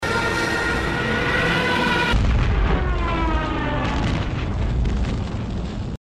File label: plane